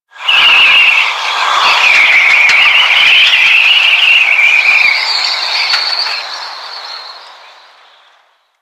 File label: Mountain Pass Wind Gust Whispy; Ambience; Warframe; The War Within